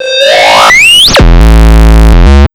JUMP 130 FX, Big Beat Drumloops; USB Soundscan vol.25; AKAI

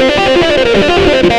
xtra lead

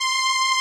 SOLINA, String Machines

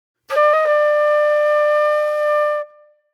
Flute

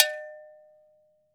afro ago, afric ago go

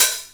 open hihat; Techno Trance Essentials; DRUMS

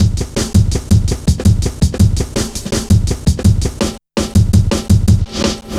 Hard Edged, Drum Loops, 165.5

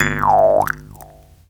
JEWS HARP; Jew's Harp & Spoons

